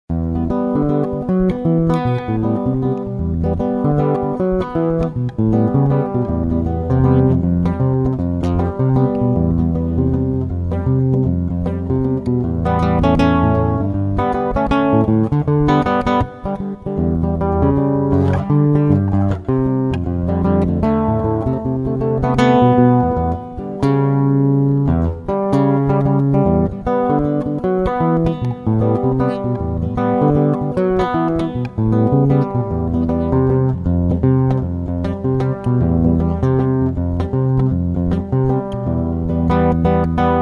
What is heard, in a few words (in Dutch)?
Brouwer Estudios Sencillos I (Shuffle), Gitaar